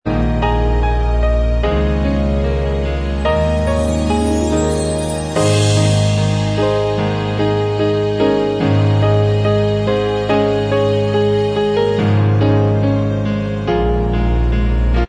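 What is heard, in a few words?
instrumental track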